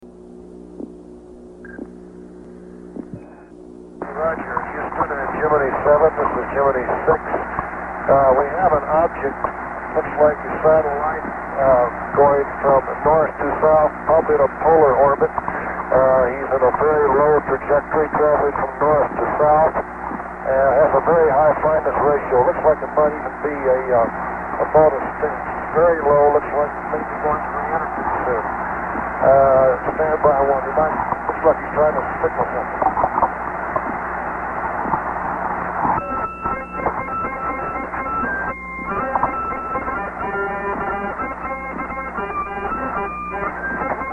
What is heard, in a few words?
Jingle Bells; Gemini VI